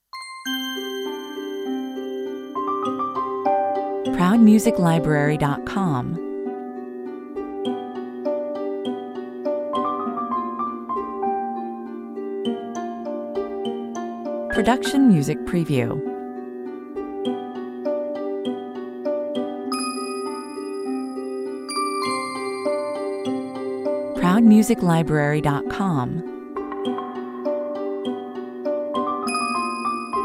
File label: magic box